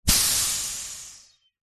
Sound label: foley air puncture air release hiss; zapsplat